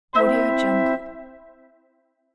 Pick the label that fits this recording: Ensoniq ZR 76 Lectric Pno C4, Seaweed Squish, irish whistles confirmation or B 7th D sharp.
irish whistles confirmation